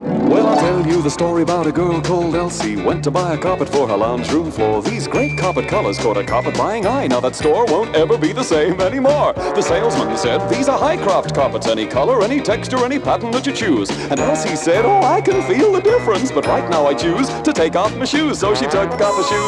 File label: Carpet Jingle